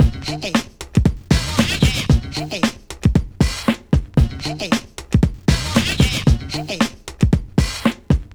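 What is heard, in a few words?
115 Pop Combo Beat Eb major; Digidesign; Pro Tools; Pro Tools Essential Loops; Pop Rock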